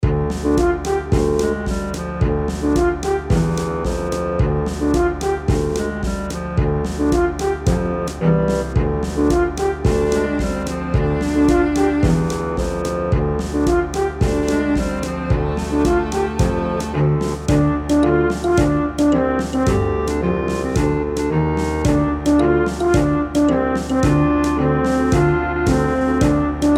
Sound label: combat music